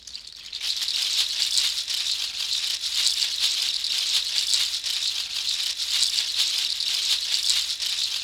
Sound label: Goathooves Loop, shakers